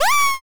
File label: pico surprise